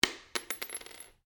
Cartridge 22 Caliber Long Impact Polished Wood Stereo; shell casings of different calibers impacting on different surfaces; SoundEffects Foundation2